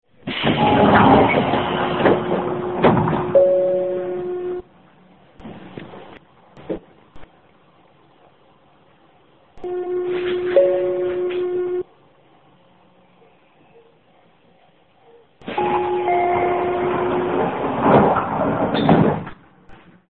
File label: 9102f in door; car